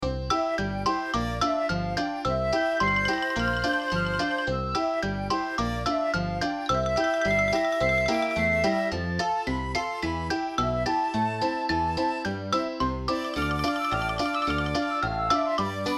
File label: LOOP Childrens music; Slightly quirky and joyful, for comedies; Mood: Joyful; BPM: 108